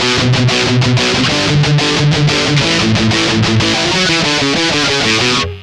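Les Paul Mesa bridge, Bridge pickup, Sansamp в режиме Mesa/Boogie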